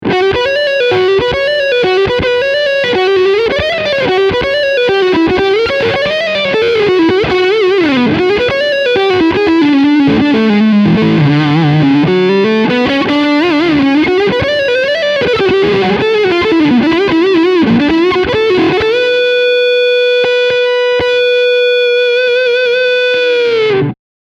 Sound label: Leadsound Neck EMG